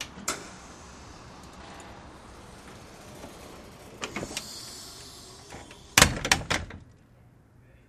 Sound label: Hospital Door Close, pneumatic